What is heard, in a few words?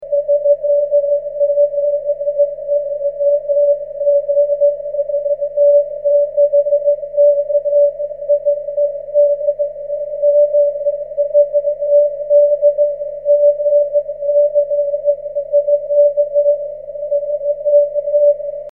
cw, 9cm; G4KGC